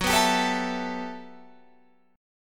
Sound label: G Major 13th